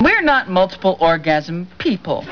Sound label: orgasm